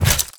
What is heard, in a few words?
metal small pickup, inventory; ui